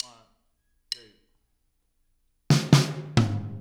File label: Intro, Full Mix, Drums; Sonic Foundry (Sony Creative Software); Drums from the Big Room The Mixes; Demo Projects